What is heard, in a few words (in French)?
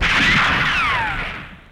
Pistolet styl western; BRUITAGES